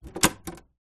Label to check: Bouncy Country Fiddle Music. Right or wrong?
Wrong